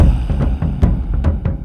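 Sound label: Music Shield; From Dust; ps3; xbox 360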